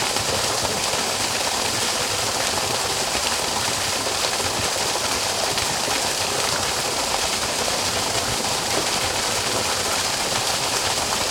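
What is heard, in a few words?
sound of a small waterfall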